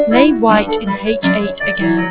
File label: music SP